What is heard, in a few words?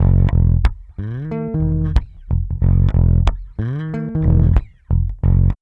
BASS, Funky Ass Loops